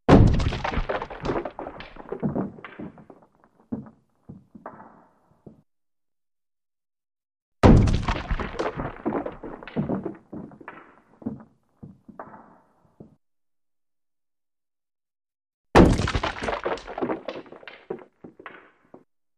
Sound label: Slower Big Rock Hits; rocks